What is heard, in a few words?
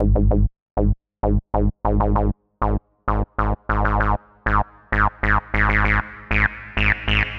Techno; Goldmine for Serum